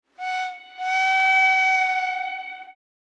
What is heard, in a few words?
train steam